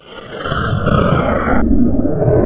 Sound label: FX REVERSE